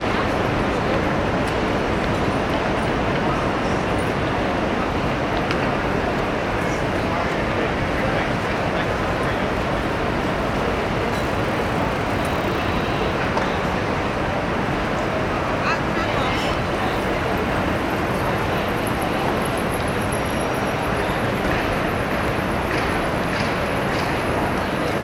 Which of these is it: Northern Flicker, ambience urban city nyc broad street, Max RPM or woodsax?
ambience urban city nyc broad street